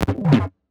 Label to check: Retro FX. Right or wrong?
Right